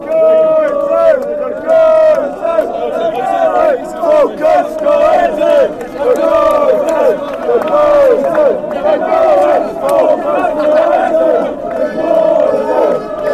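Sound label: going down dunfermline, football chant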